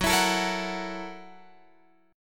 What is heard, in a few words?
Gb 7th Sharp 9th Gb Guitar Open F; Listen to Gb7#9 strummed